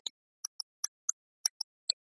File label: Glockenspiel